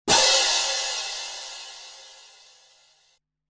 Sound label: Orch Cymbals; synth patches Korg Prologue; Orchestral Cymbals; Dystopia